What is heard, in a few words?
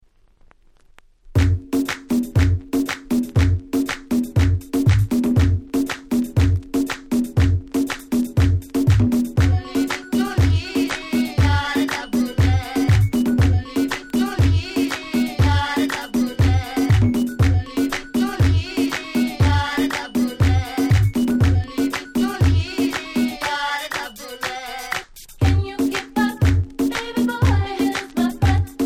reggae